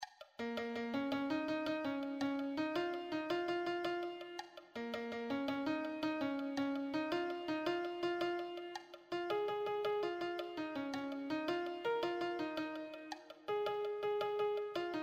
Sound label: solo, piano